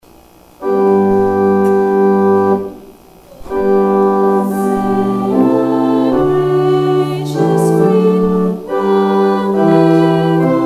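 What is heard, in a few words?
national anthem; Thinking Day; as the flags were returned to the flag bearers, to be lowered as we sang the National Anthem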